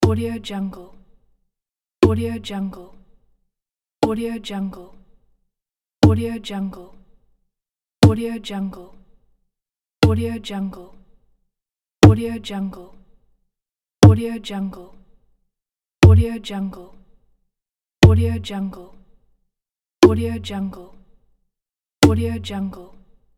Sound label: djembe drum kicks; dyomin